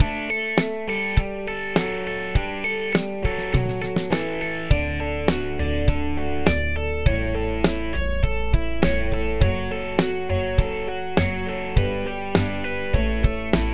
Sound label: Little Groove SLOW